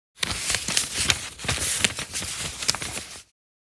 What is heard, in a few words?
Paper shuffling and organizing office supplies